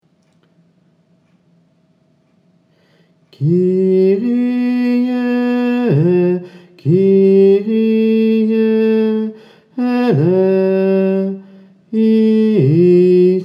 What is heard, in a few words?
Kyrie Tenor; Messe de Saint Augustin